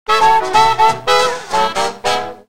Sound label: Stinger; Card Nuka